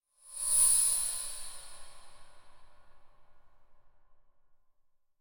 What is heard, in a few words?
Cymbal Metallic Whoosh By Thin And Fast High Pitch; Hollywood Edge; sound designer's toolkit v2